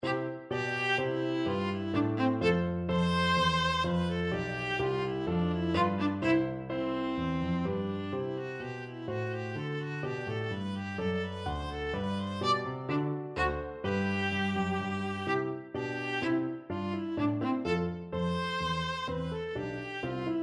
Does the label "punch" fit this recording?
No